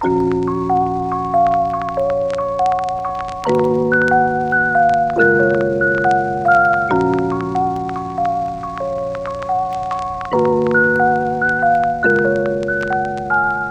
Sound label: Lofi Melody - 70 BPM D# Min